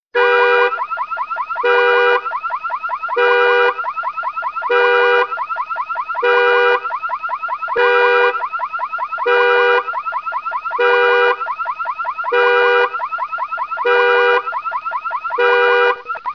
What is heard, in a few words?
Car Alarm Ringtone